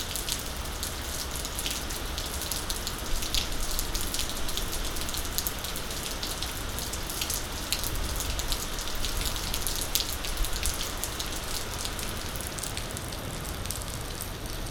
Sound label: Rain, binaural